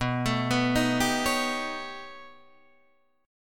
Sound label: B Augmented 9th B down Guitar Open D